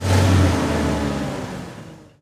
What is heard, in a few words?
engines; World of Tanks